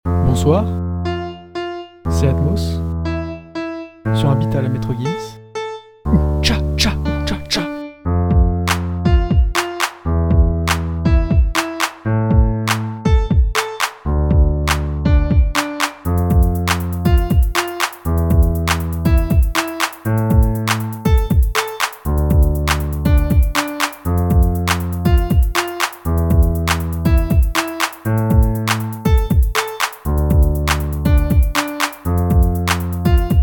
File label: beatmaker